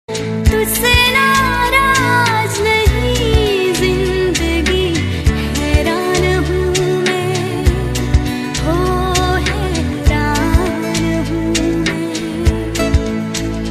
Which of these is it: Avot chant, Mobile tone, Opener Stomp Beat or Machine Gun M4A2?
Mobile tone